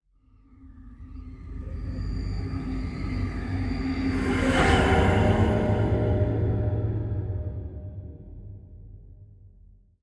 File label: Jack Box Sound